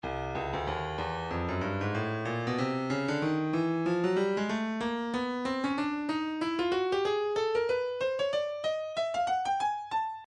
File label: breakbeat midi, 93 bpm; hipster b; Stylus Breakbeat Midi Files